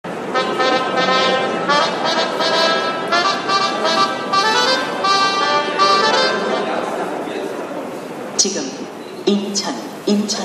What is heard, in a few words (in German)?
Fanfaren U-Bahn